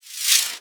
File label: Sci-fi Transition